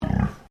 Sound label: Animal Pig Landrace Inside Grunt